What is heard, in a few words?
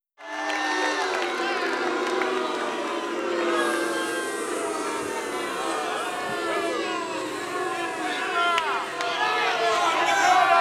Crowd Boo Medium; Vocals & Wallas